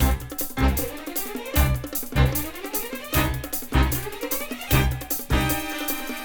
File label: strings sudden tension, loops, phonky